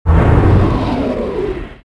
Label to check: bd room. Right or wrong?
Wrong